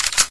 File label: m4a1 bolt pull, weapons; Source Engine; cstrike